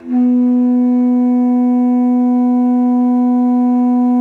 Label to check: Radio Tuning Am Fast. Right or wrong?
Wrong